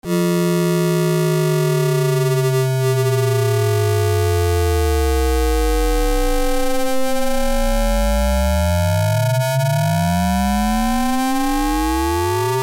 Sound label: ringmod, synthesizer basics